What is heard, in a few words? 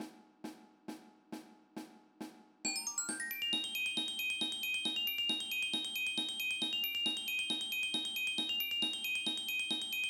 glockenspiel